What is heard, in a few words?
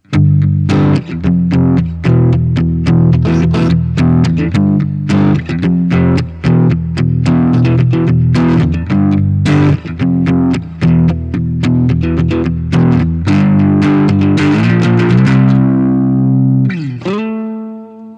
crunch